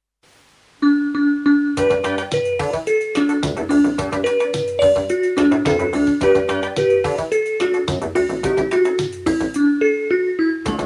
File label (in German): Reggae; Playbacks fur Kinder